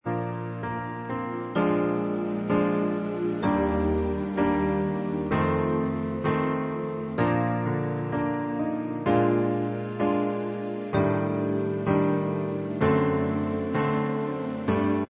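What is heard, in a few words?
instrumental, track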